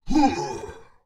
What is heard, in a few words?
Temple Gargoyle hit; Arenill